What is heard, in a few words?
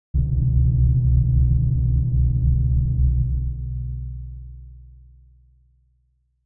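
Orchestral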